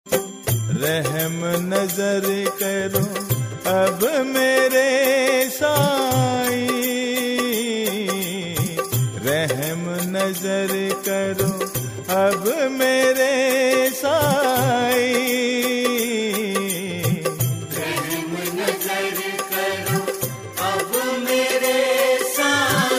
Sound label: RINGTONE